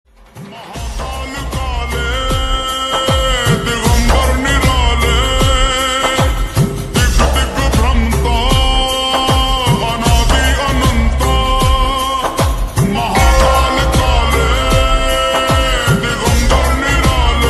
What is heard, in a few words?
Slowed Reverb Ringtone